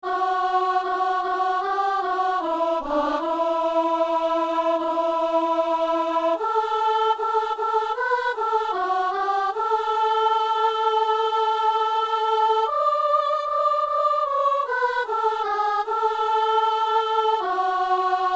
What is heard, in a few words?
soprano